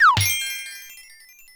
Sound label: Coin Battle In The Lead; New Super Mario Bros Wii